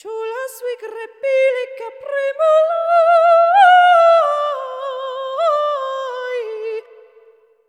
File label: rock pop vocals